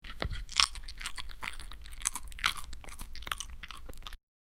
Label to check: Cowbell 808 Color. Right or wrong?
Wrong